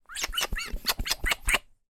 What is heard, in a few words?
human lots of kisses